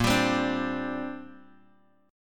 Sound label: A sharp 6th Add 9th, Guitar, DADGAD; 8,10,10,7,x,x; down